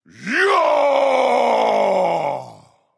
battlecry; smite; olorun

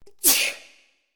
sneeze; smite; medusa

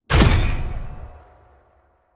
giant fire impact; smite; vamana

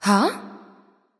confused; smite; voicepack; khepri

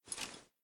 mvt armor; serqet; smite

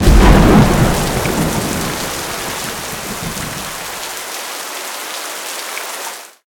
rain; smite; chaac

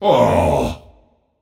ugh; cernunnos

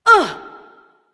grunt hit; smite; artemis

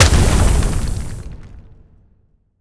impact; smite; olorun

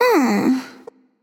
sigh; cupid

